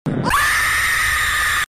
baby jumpscare

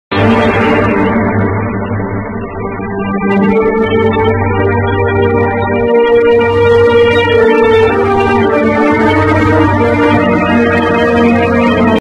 low quality sad music; spongebob

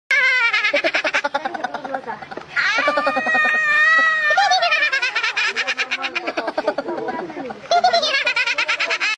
Two girls laughing

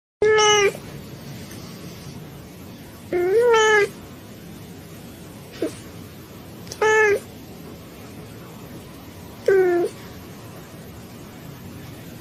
Sad Cat Meow

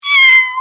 Cat Meowing

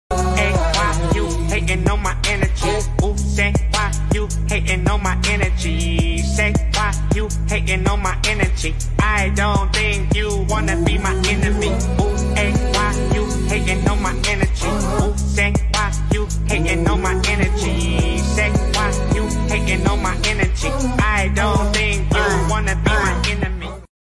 Rapping meme; Peter Griffin; meme sound effect

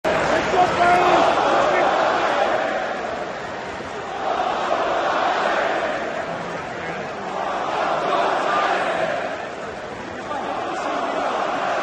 chant; FJB

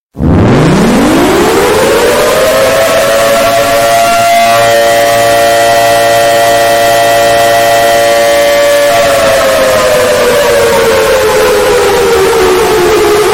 nuclear alarm ear rape

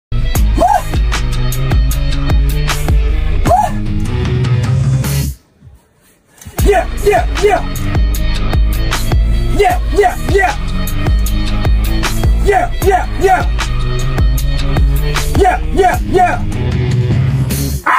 screaming

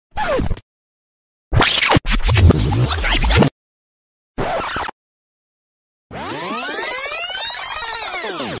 Reverse Rewind

